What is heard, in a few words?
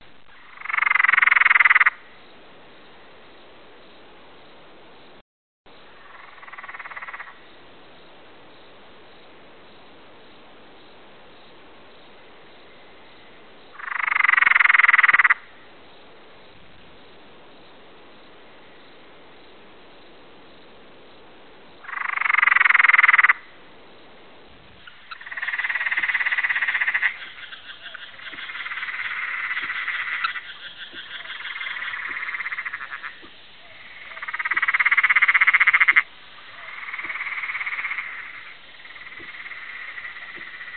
frog, nature